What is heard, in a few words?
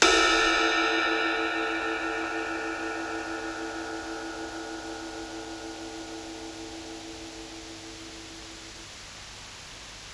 Ride, Psychedelic Drums